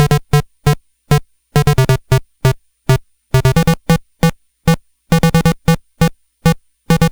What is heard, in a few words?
Game Boy Bass; Nintendo Game Boy